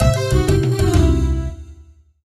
Fail

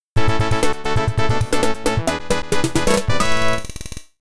Level Complete; Pang; Buster Bros; Arcade

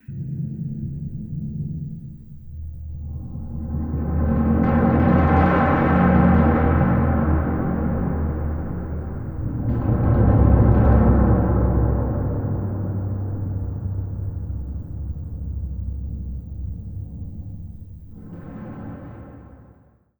Scary Short; Hitman Blood Money

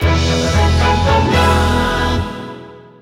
Goal Fanfare; crash bandicoot series sound box; Pyramid Pass

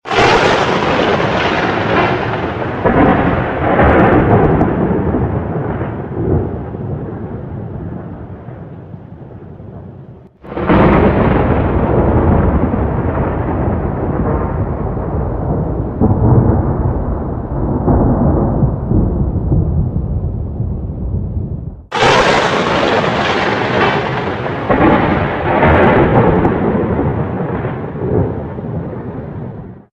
Intro Lightning; Chronicles of the Dark Lord 2

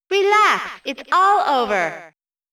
ROUND CLEAR; Fighter Attacker; Shinji Hosoe Works Vol.4

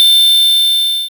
Stone

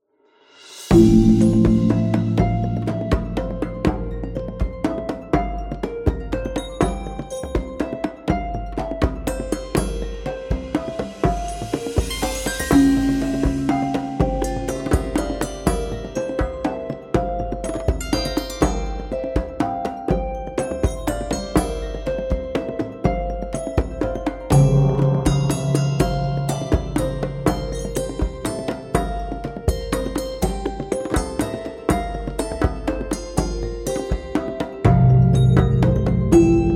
Loop Carefree

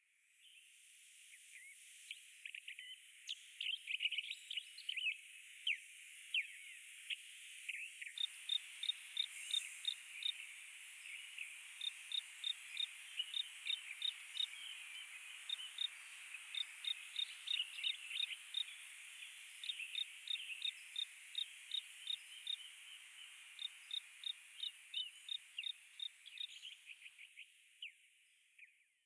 amb generic outside night; Lucius III

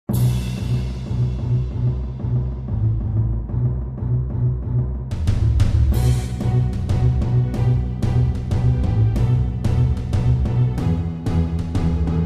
Music Fight

